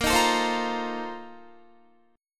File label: Bb Major 7th Suspended 2nd Suspended 4th, Guitar; strummed